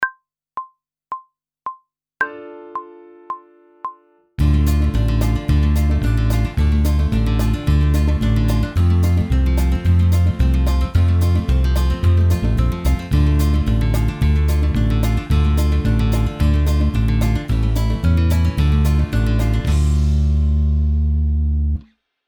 Technical Work Riffs D minor (backing track), pop vocals; grade 2